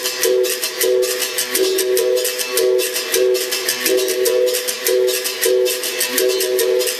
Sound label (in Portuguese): Samba de Roda